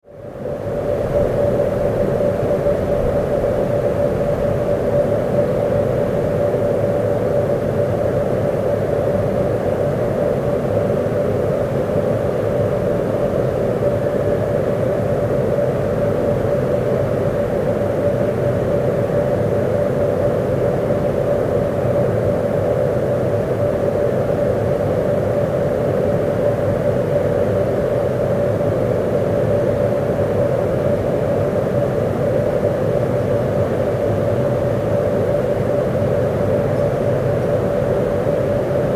Cold Storage Container, Dock side storage - aircon container; Machinery; Tom Hutchings